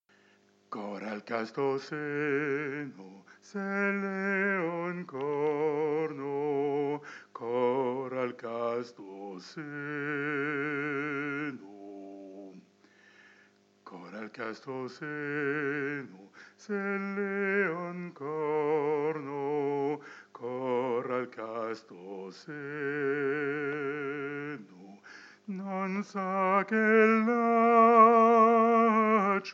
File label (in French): voix